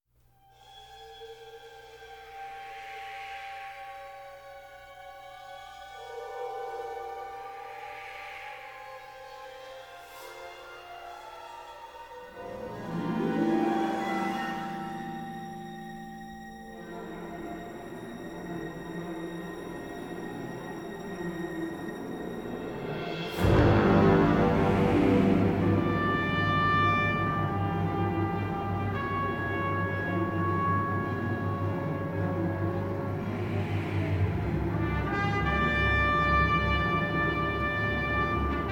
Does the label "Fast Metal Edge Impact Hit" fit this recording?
No